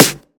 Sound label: clear steel snare drum sound D key; snare drum samples